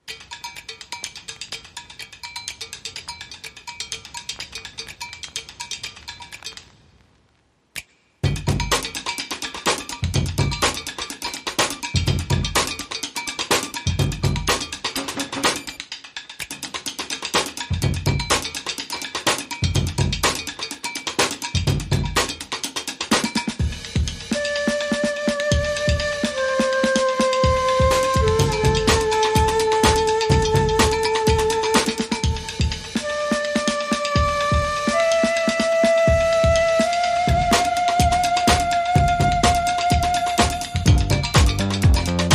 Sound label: No Piano